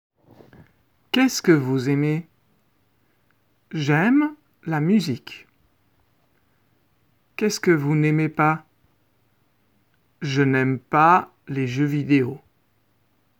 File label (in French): 8 Voix